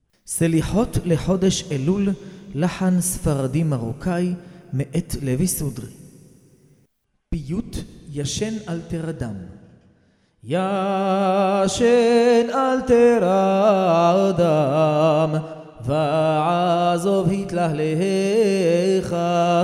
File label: recorded prayer selichot elul morocco